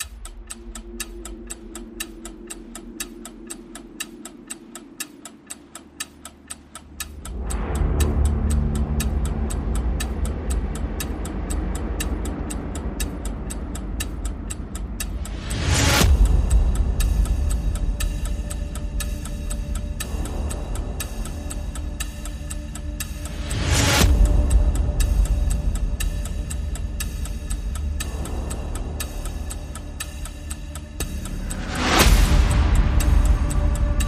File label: Percussion and FX